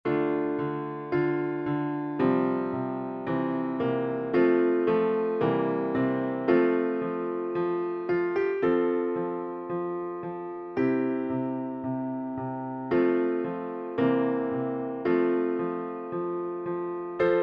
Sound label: music alto accompaniment